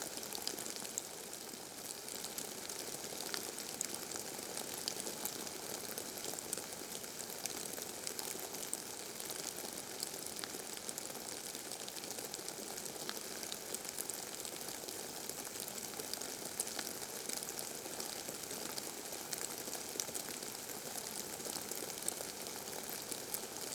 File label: ambience rain light; Rust